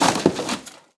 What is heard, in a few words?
kartuz, guns tanks